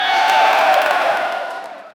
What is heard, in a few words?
realistic stadium